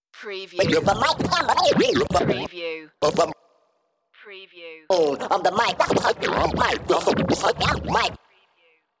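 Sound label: Scratch Groove 105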